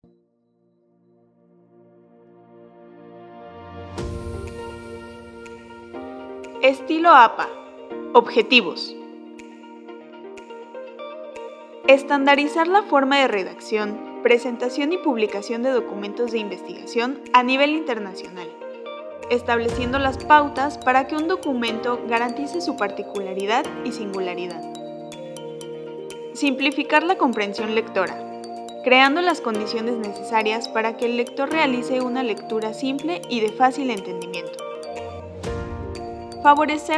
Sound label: song